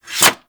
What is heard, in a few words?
Sword Board Unequip; Warframe; Tenno